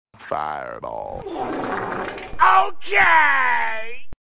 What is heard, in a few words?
Wet Fart